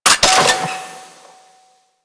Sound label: Flak Cannon Mech; Grineer